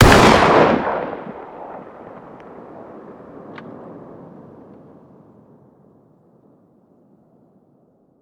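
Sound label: fire distant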